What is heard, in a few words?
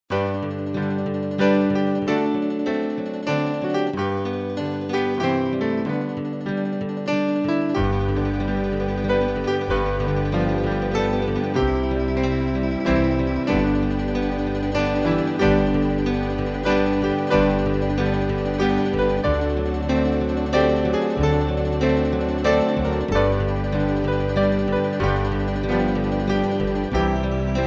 band; Amazing Grace